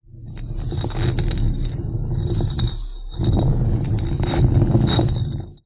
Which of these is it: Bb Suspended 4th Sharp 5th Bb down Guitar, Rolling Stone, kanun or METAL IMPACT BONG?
Rolling Stone